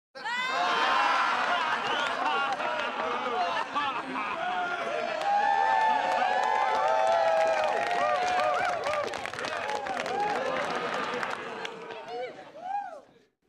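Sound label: Crowd 50 With Cheers Applause; medium int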